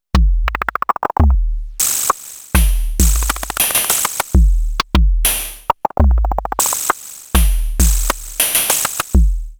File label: Money Groove, Beats